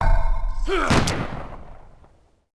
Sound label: Build Infantry; Civ4 warlords